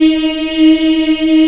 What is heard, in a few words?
D#3 CHILD, Choir; CHILD IHP